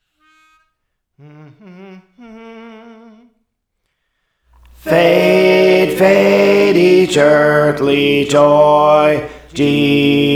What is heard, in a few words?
Alto; Praise For The Lord Training; Jesus Is Mine